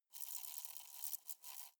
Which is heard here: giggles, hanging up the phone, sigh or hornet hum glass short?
hornet hum glass short